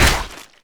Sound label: impale target hit; weapons; dota; lion